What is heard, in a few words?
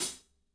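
closed hi hat left, NATURAL KIT; Spectrasonics; Burning Grooves CD2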